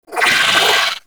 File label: Vocal Pain; Warframe; Moa Satyr